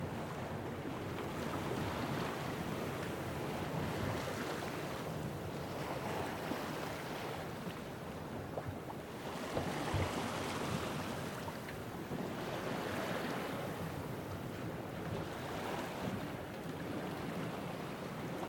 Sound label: Water Shore